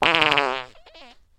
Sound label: fart medium